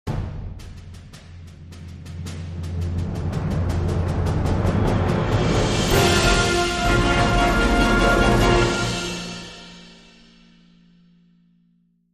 Dramatic Film Crescendo & End

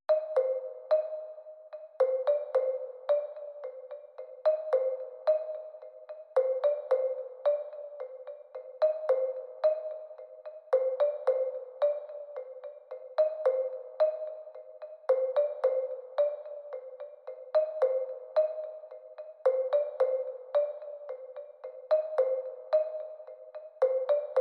Marimba

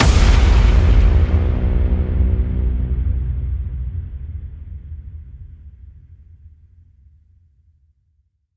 hit big

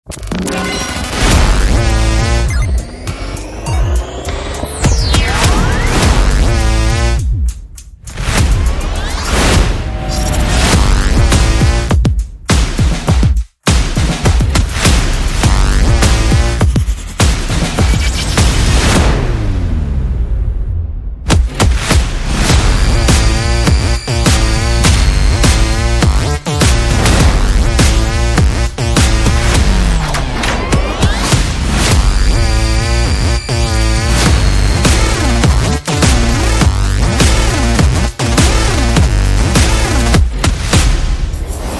Futuristic Rumble, No Vocals

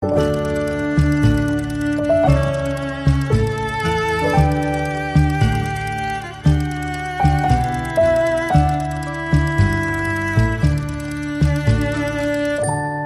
Music Box Lullaby

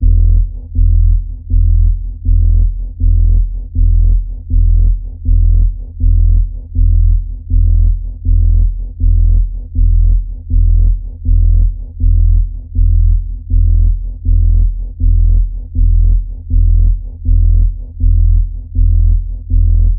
Threatening Doom Pulse E, Key of E

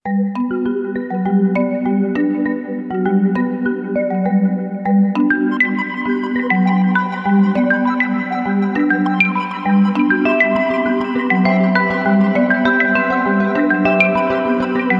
Bells Ambient

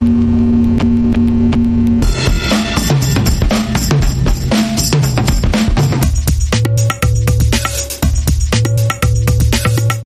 Static Groove Dance Bumper

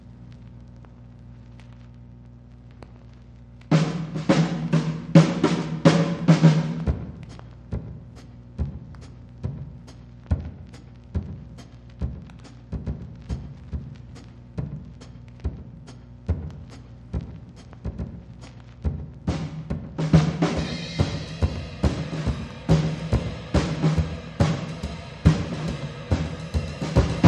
Drums

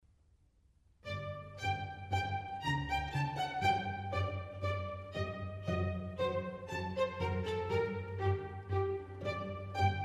Sinfonia for Strings in G major; RV149; Antonio Vivaldi

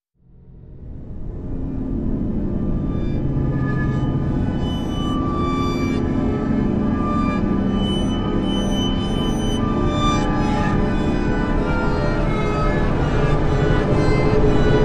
Suspense, No Banjo

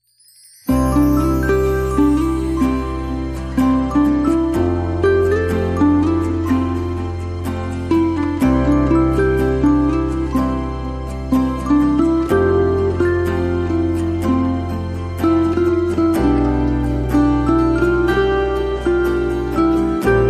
Tranquil Breeze Guitar Lead

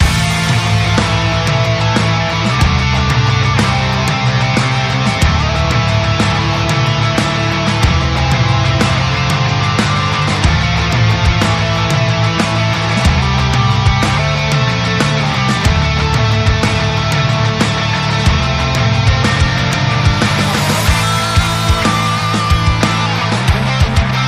Hard Rock Strut, Loop

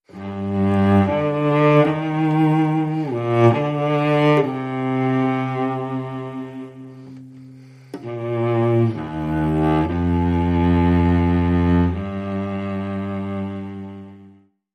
Intimate; 15sec

